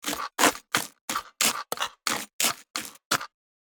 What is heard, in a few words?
Lettuce cutting; cooking